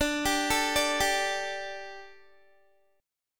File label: D Suspended 4th D, Guitar; Dsus4; arpeggiated; 21,22,21,19,19,x